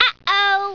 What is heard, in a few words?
Draw